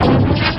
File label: Shotgun